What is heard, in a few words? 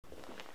flip